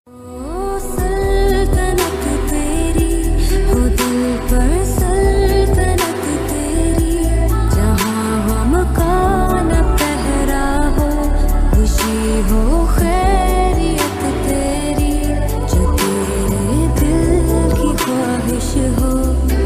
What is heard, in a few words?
female version, hindi ringtone